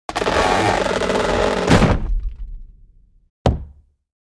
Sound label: Coffin